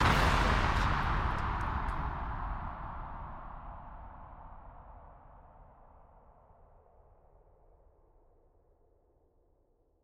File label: Explosion Blast; SoundsCrate